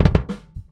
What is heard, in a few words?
Doublekick Loop; Airwindows